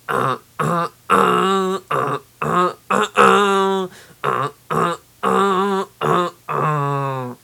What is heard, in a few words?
guitare electrique; breeze custom ltd